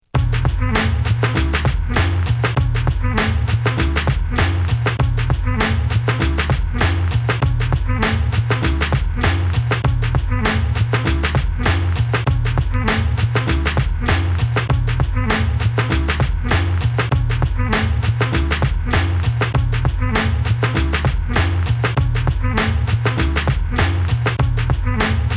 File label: beats, bpm 98; wicked mix vol 028